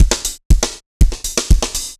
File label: dancefloor tight 120